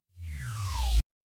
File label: transition sweep